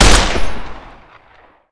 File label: assault carbine, weapons